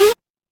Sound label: retro game power up